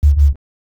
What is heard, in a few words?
reason bass short; neuro; Sinistarr